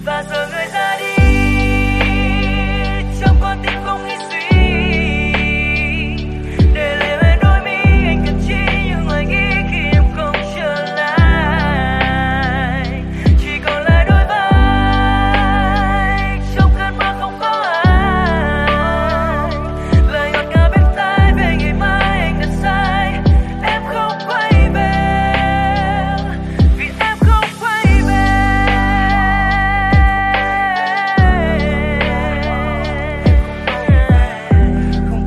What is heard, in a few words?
Lofi; nhac chuong